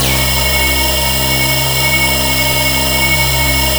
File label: ACTIVATE; Spectrasonic Distorted Reality 1